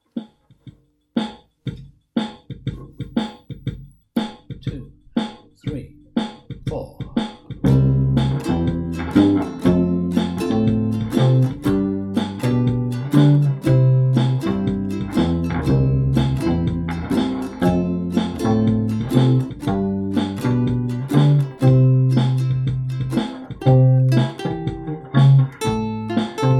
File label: Bass Practice